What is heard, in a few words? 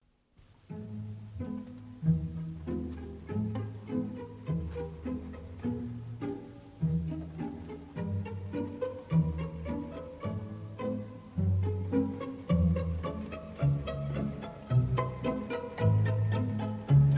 Talisman Female Variation